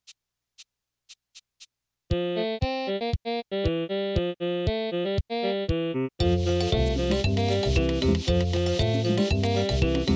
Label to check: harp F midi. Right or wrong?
Right